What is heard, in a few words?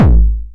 909 BD Tape Sat